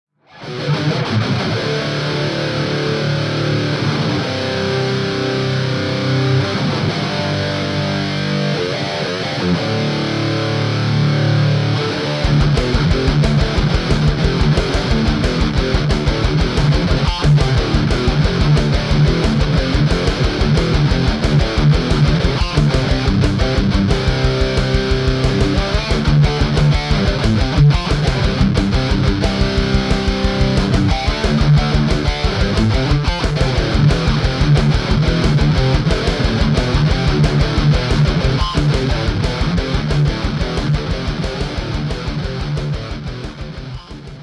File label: Rectifier Metal; Vox Tonelab LE